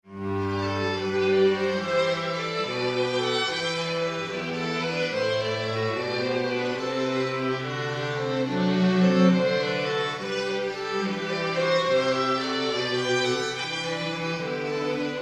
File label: strings